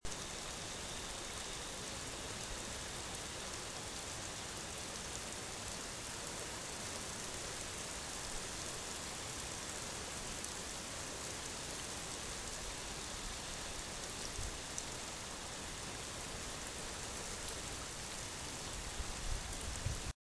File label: raining hard